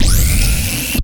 Transformer Speedup

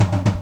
Toms

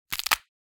Neck Bone Snap

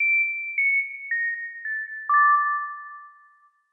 Tiny bells

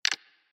Iphone Click

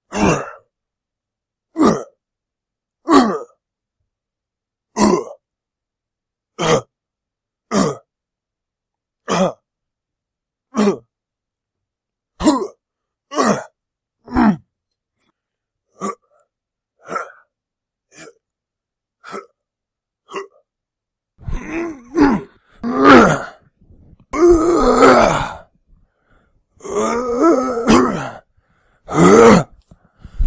Male Grunts

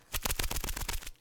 Paper

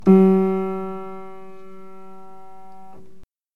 Piano

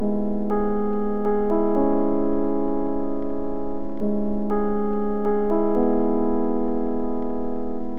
Chord Melody

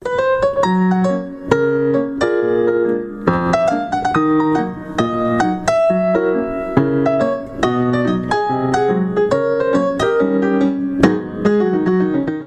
Positive Music Umbrellas Ragtime

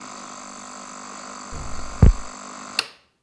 Sfx Radio Changing Out Of The Station

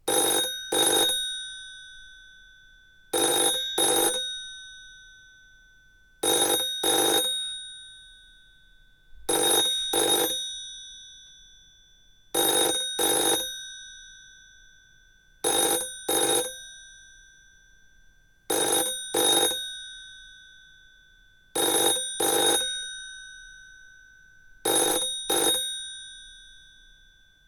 British Telephone

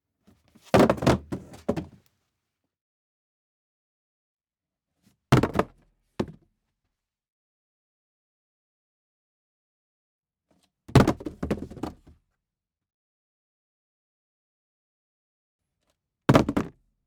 Boxes Fall On Wood Surface

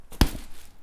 Hitting boxing bag

